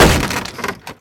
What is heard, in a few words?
wood joint break; Bad Piggies